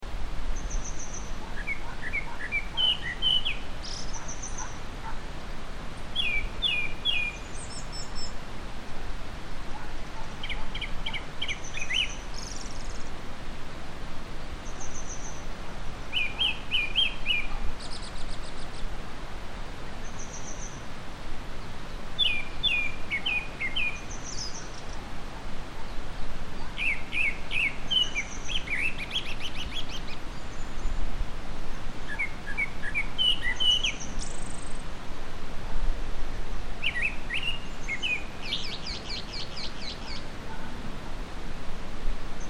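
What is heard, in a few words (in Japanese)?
kurotugumi, Japanese thrush; クロツグミ; 標高750ｍ〜850ｍぐらいの田園地帯（里山）; 鳴き初めの「キョロンキョロン」というところは似ているが、バリエーションに富んださえずりでとても良い声をしている。; 姿は頭から背中、尾羽まで黒くお腹は白地に黒い斑点が見られる。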